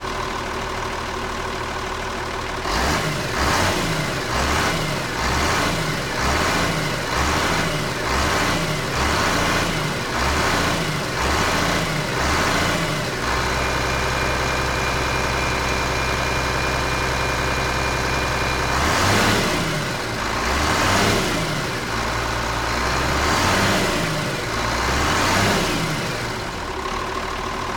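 Vehicle Farm Tractor Magnum 7250 ONBOARD Driving Sequence Idle And Revving ENGINE LEFT; Dramatic Cat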